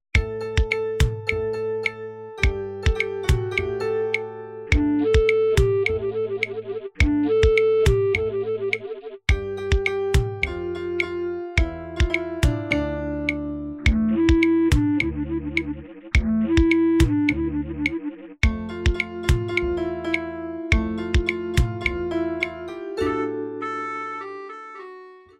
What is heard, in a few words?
Vibraphone and Flute